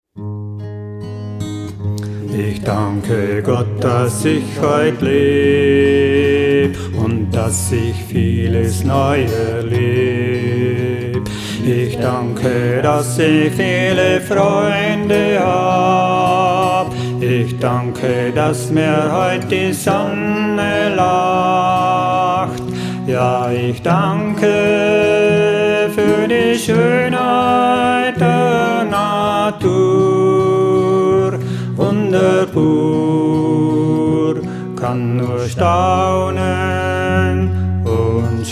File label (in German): Lied Dankeschoen, Dankeslied, Gesungen